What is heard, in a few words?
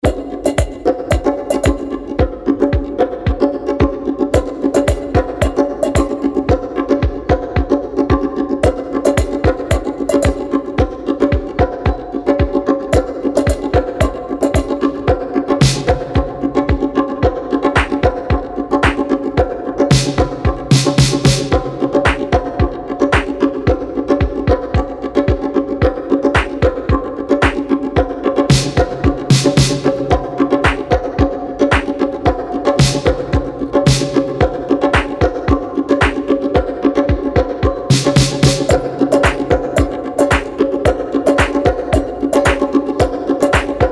Rap Bonus Beat